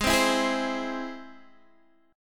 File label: Ab Augmented, Guitar, Open D; x,11,10,10,11,10